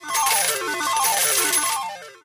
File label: jackpot, Slot Machines; RS Games Sounds